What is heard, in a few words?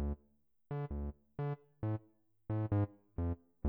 303 bass